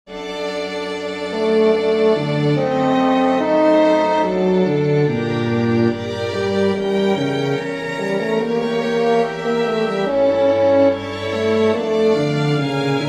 recitative for horn strings; Johann Sebastian Bach; der mensch ist kot stank asch und erde

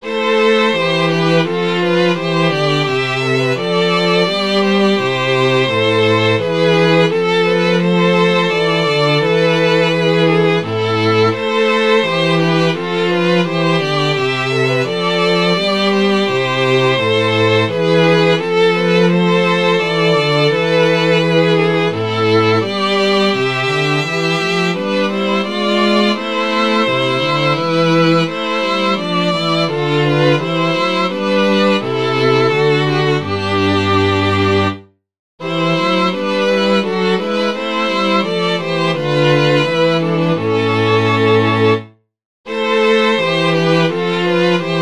chorale for string quartet; bach johann sebastian; die feind sind all in deiner hand

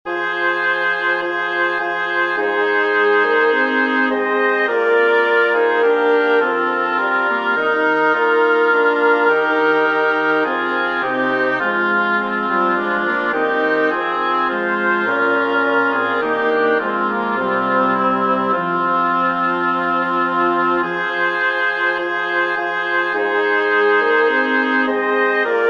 joli bois for double reed quartet; sermisy claudin

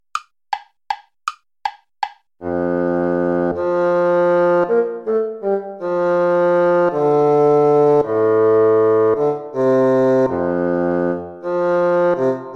piece for lute play along

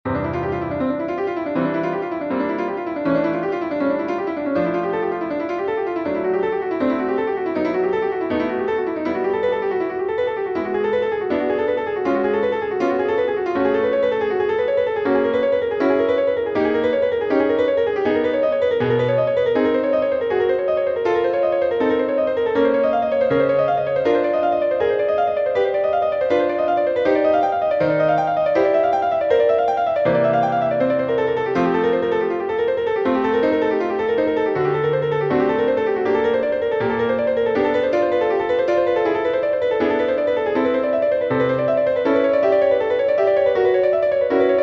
exercitium, piano; clementi muzio; major from gradus parnassum

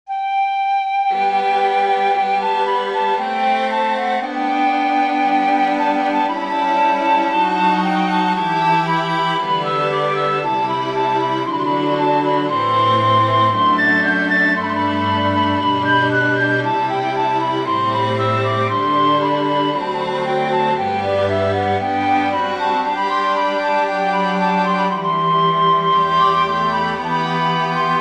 prelude for piccolo strings; Johann Sebastian Bach; wenn wir chsten ten sein

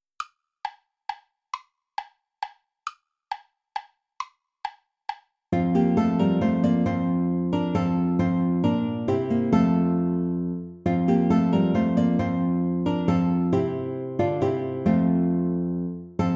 volta play along without guitar; praetorius michael

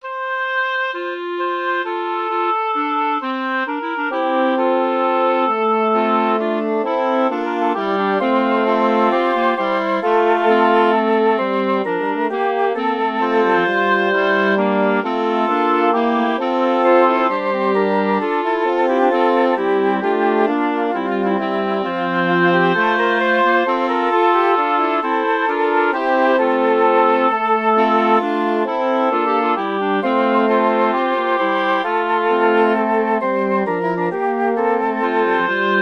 for woodwind sextet; a dieu celle

